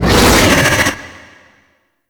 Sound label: Attack Vocals; Gameplay; Golden Maw; Warframe; Ambience; The War Within